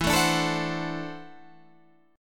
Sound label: D 9th E, guitar; D9/E; strummed